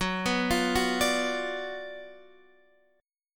F sharp Major 7th Suspended 4th Sharp 5th F sharp, Guitar DADGAD; chordshape; down